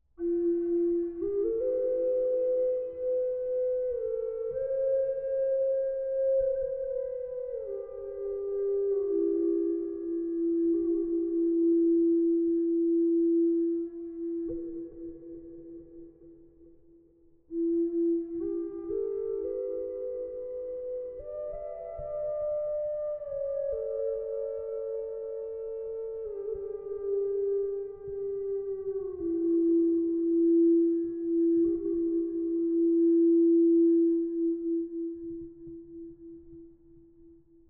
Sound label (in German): F 432 Ahorn mit hall, drone flute indianerfloete doppelfloete; f 432hz